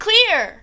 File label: Bonus Clear